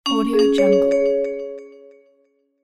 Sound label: alert